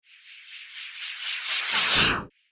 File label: Whoosh Incoming Vacuum; Whoosh Sound Effects; sound design